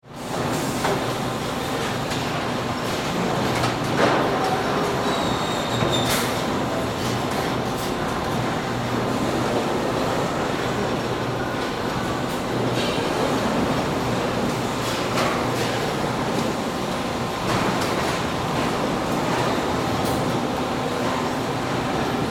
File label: Factory Body Shop, Industry, Area, Workers, Assembly, Plant; General, Motors; Auto Shop; BlastWave